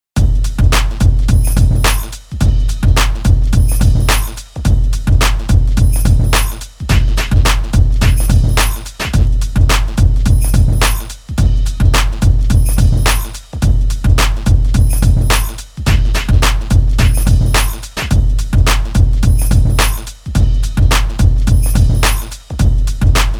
loop bpm 107

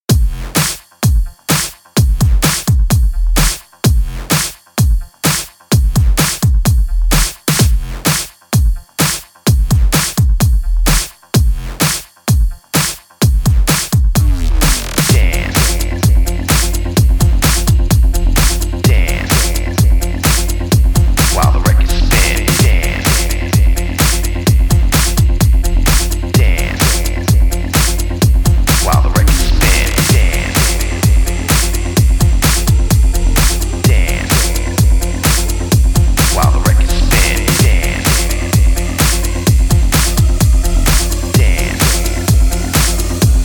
bpm 128

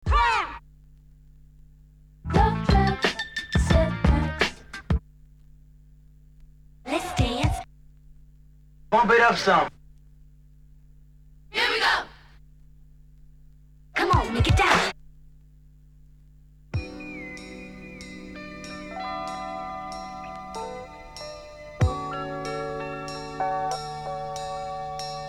samples scratches

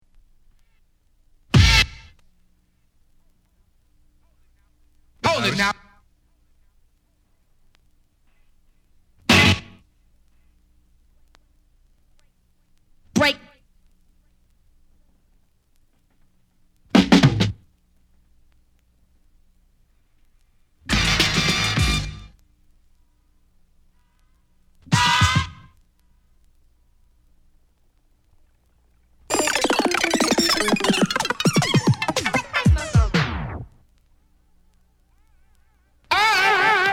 samples scratches

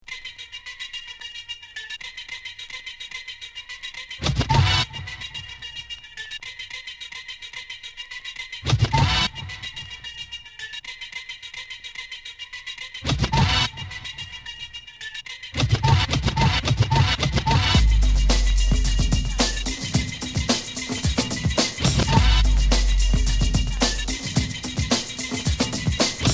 beat

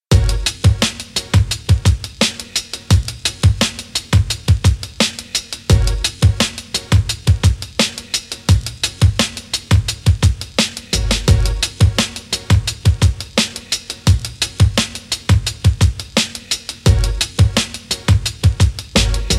bpm 86

